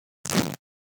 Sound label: Cloth Split Rip Tear - Shot - Foley Cloth Movement; Hollywood Edge; sound designer's toolkit v2